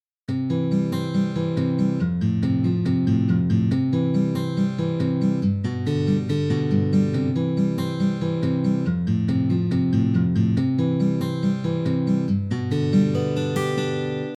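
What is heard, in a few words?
steel guitar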